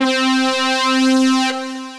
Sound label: metal syn pad; Synth Pad; Majesty; Ableton; Factory Packs; Build and Drop